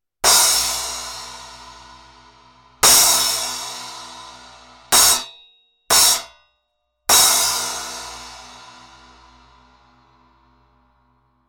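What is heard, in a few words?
splash ISTANBUL MEHMET SULTAN 12, CYMBALES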